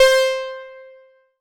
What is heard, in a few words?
PULS C6; KEYS AND GUITARS; Synclavier Sampler Library